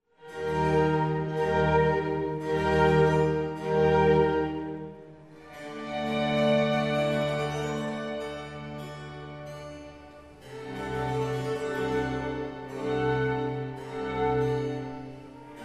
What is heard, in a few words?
Concerto for 4 violins cello strings & bc; in F major; Concerto No 7; Antonio Vivaldi (PD)